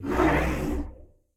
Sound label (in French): Monstre loup; server-nalc